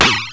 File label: crash; Enciclopedia Del Perro